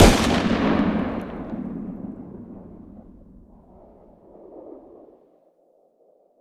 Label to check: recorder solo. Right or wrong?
Wrong